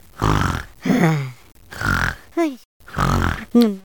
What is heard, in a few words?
Snore Squeak